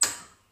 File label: turn stove on